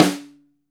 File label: ST AMB SN, STEREO AMB SNARE